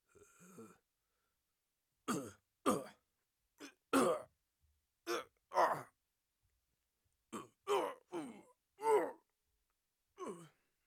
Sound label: Evil Guy Grunts